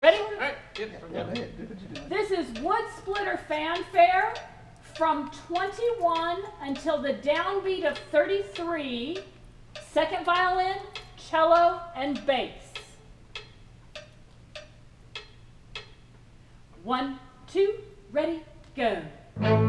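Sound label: Wood Splitter Cello Bass; 2nd Cllo Bass m21-32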